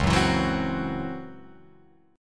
C sharp Minor Major 7th Double Flat 5th, Guitar Open F; strummed; 1,1,4,1,3,1